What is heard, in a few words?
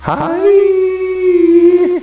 DRUM; Civilization 2 Multiplayer Gold Edition; MAMMOTH